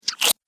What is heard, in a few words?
Lift Foot Out Of Swamp, slimy sound effect